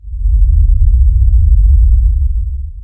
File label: Bass Rumble